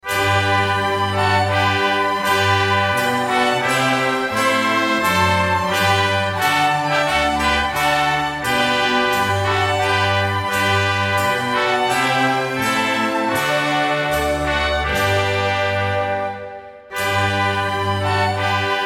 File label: menuet